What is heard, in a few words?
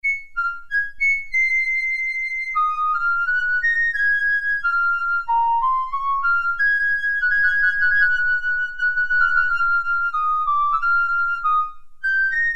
Flute, Korg Poly-61M